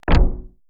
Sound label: PERC MIX, Retro Sampler; Best Service ProSamples vol.55; AKAI